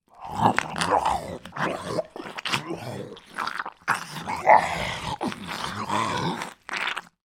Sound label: horror monster zombie male eating body; sound effects one